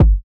Kick G#

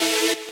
saw

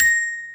N Bell A#6

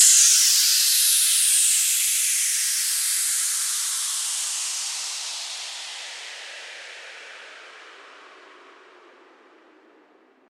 sweep; osu

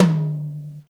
high tom

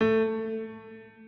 Piano A2

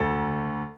piano sus

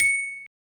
Bell C#7

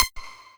lead

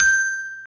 normal slider tick

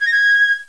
pipe

